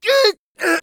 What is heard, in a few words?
hit; Escape from Tarkov; scav3